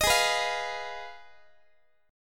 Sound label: G sharp Minor 6th G sharp down Guitar; G#m6 strummed; x,x,18,18,15,16